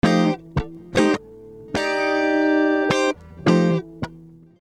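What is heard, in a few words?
guitar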